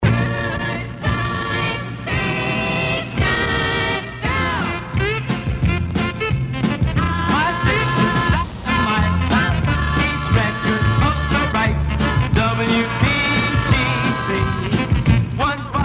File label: jingles; 1961; crc; tune toppers; good time station